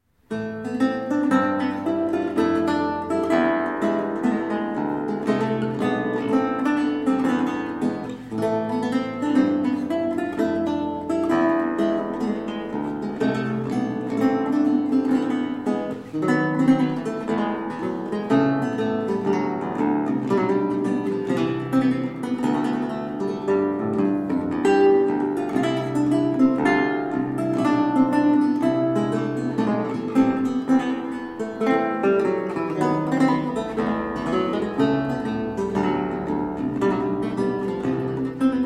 Gavotte, Works for Theorbo; Musique pour le Roy Soleil; Robert de Visee

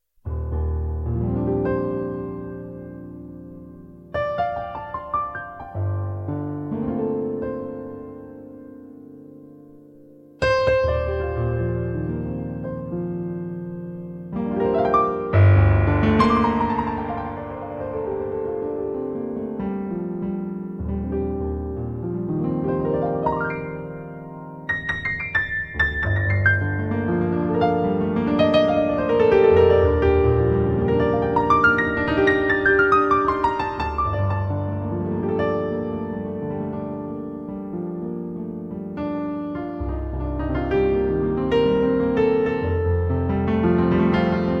Eternity Song (Improvisation)

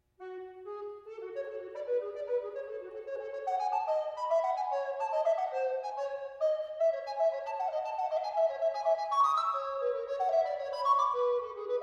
Fantasie in G minor Spirituoso; Telemann

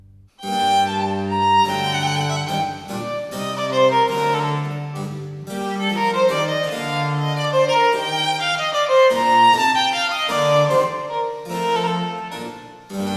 Sonata in g minor for violin and continuo; Albinoni; La Serenissima; Per Monsieur Pisendel

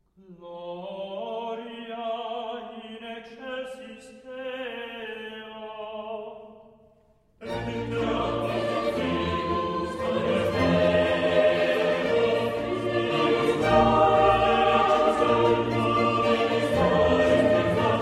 Gloria Allegro di molto; Haydn; Musica Sacra

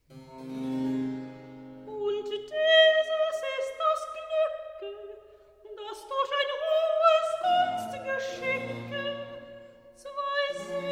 Recitative; Weichet nur betrubte Schatten; Bach; Cantate

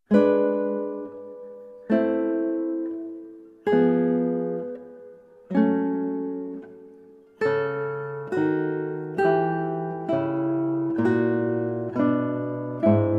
Largo from Trio Sonata, Guitar; TWV 42 E7; Georg Philip Telemann

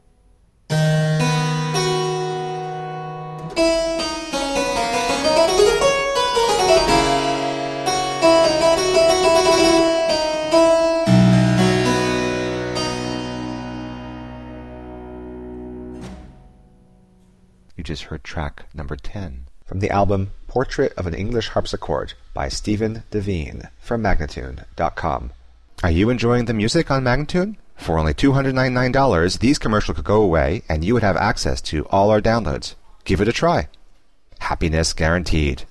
Sonata in F Major Adagio, English Harpsichord; Sonata No 1; Thomas Arne

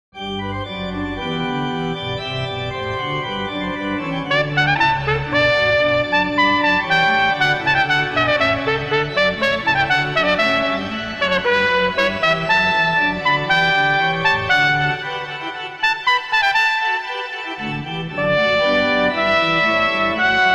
Allegro Sonata in G Major, Horn and Organ; Jean-Baptiste Loeillet

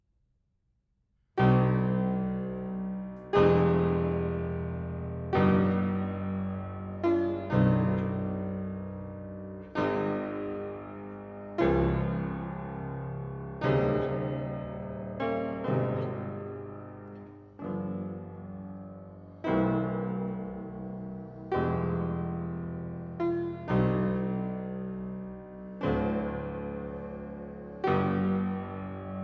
Preludes Op 28 No 20 in c minor, Piano; Frederic Chopin

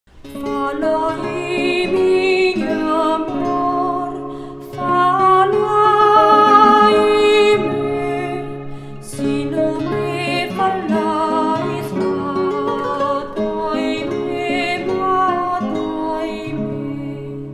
Guitar; Falay mina amor; L Milan

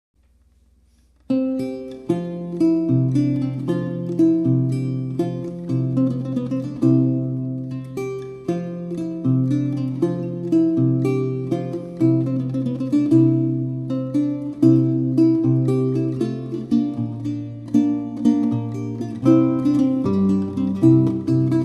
Branle Poictou, Lute; Pierre Attaignant

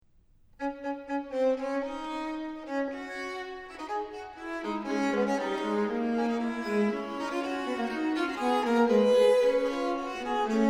Fugue arranged for string quartet in D Minor; Fugue from WTC II arranged for string quartet by WA Mozart K405 No4 in D Minor (BWV877)

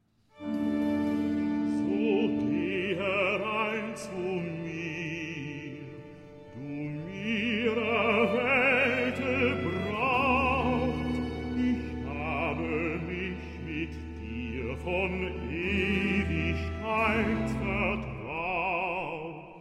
Recitative; So geh herin zu mir; Bach

